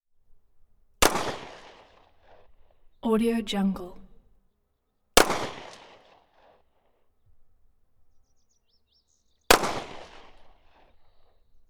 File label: gun 9 mm beretta